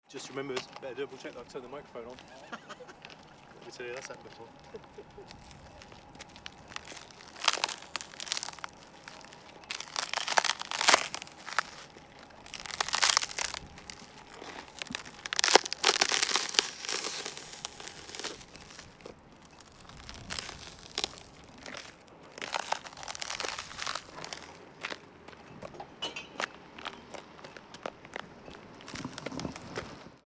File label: Ice heel; Edirol R09